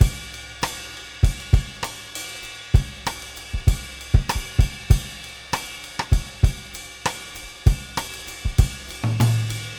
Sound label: Beat Loops, 098; Zero-G; Monster Beats